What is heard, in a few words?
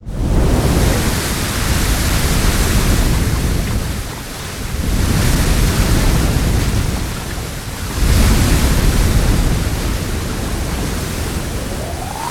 Geyser No Toon; toontown-ceiling